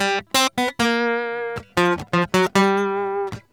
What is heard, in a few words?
MERENGUE, World Grooves